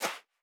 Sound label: Footstep Sand Walking; Character Sounds